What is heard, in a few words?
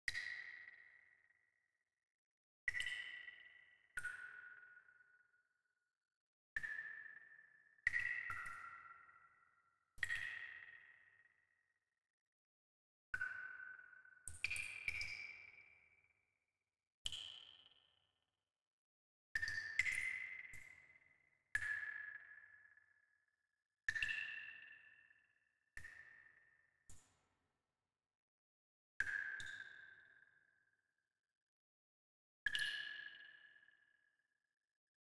water drip; AssaultCube